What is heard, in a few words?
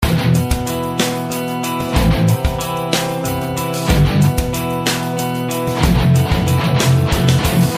Ringtone